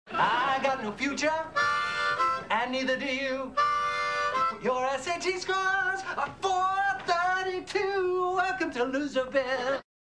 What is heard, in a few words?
blues